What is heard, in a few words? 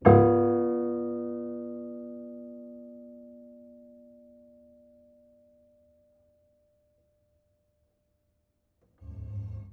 Piano Harmonics, Harm Low Mid